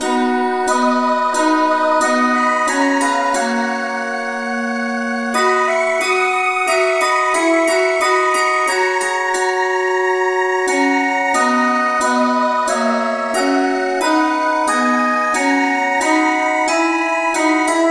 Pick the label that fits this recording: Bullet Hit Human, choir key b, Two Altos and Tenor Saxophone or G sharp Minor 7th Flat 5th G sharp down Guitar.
choir key b